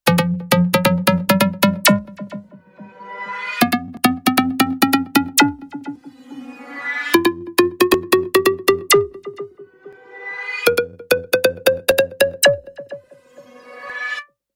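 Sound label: lumia 1020 bouncey original unusual phone ringtone; lumia 1020 bouncey originalnyy neobychnyy zvonok telefona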